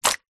horror gore strip of body flesh guts drop onto surface; zapsplat